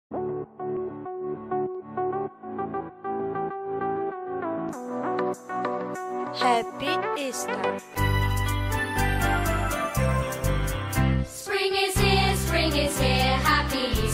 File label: Happy Easter Song